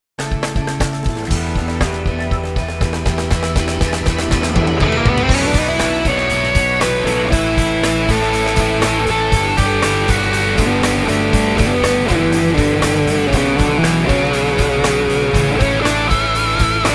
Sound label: breath hope solo